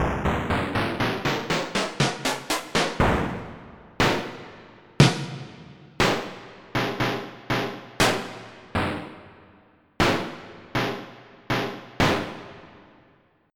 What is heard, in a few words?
Snare Stacks